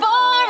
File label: vocal